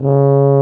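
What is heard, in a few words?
brass, C Tuba; Roland L-CDX-03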